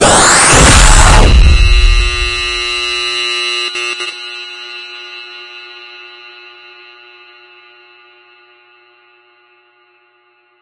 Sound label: jumpscare